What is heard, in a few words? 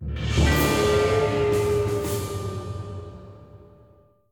Jingle Game Over